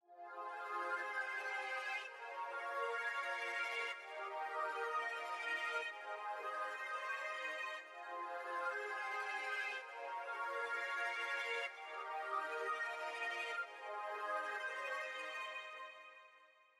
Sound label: Pad; Boomin-Beat-Starter-2